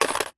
foley money coins in car centre console compartment set down; zapsplat